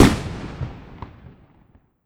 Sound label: FIREWORKS Rocket Explode Mono